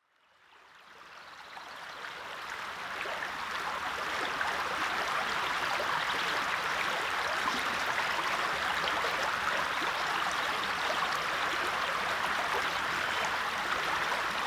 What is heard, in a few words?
European Stream